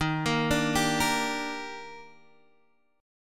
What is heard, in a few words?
Eb Major 7th, Guitar, Half Step Down; EbM7